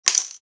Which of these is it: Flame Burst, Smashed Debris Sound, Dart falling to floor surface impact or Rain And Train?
Dart falling to floor surface impact